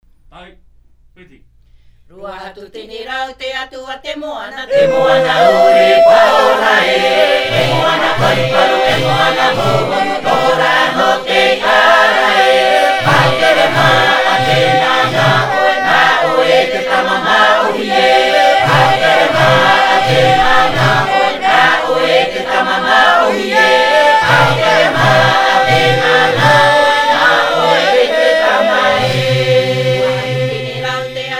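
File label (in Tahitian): Himene tarava Raromatai, chants polyphoniques maohi; Te taatoaraa o te mau reo e te Hau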